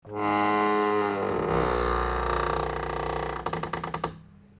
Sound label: creak open